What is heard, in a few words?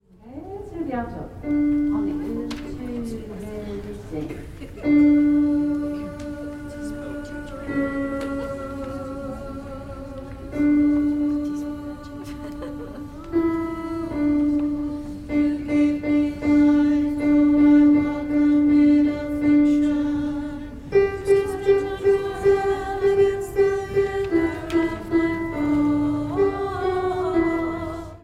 oo's alto